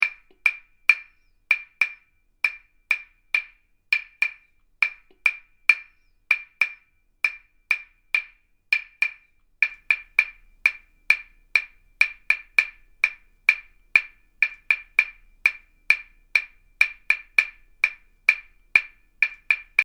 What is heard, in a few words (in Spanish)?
claves